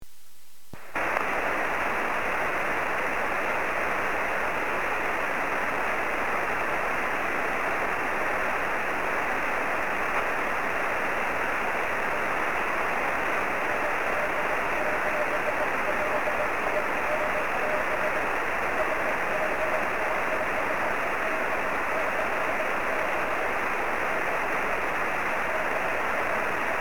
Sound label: 23cm no qso; sm7lcb